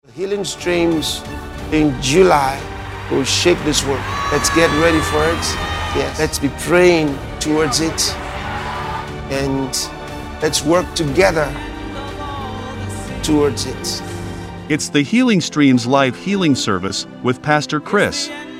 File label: JINGLE